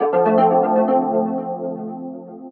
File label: harp